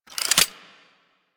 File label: Uzi Pistol Reload, weapons; Grn Uzi Pistol